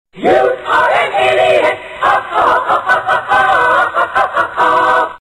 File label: Choir